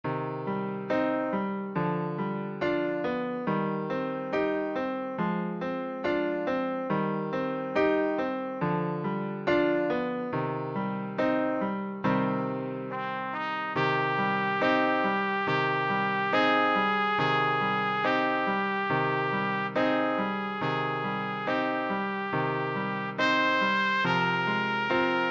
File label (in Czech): siciliana trubka, sólový part pro trubku B, klavírní doprovod; Pergolesi; Přednesová skladba pro trubku